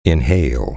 inhale

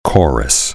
chorus

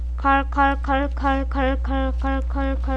car

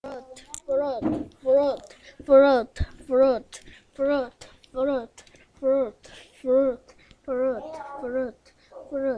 flute

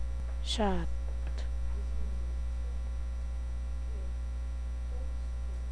shot

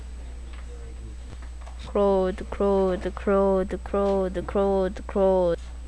crowd

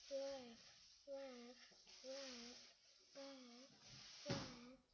laugh

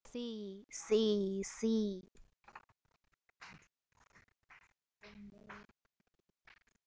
sea

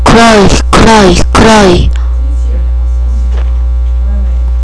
cry

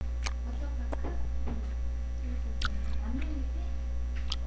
boar

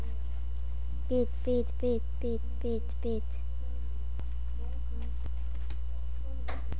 beat

